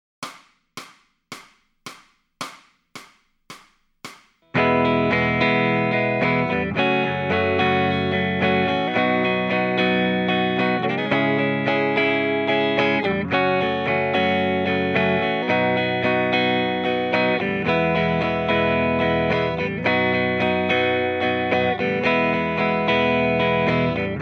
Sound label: Lead Backing Track, electric guitar